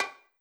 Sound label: Conga, Old Skool House, 137bpm; Belfast